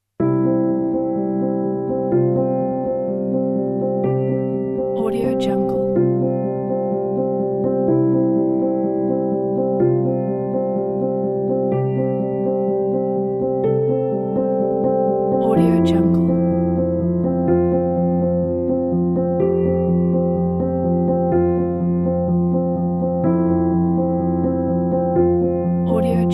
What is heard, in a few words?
inspiring solo piano; commercialmusic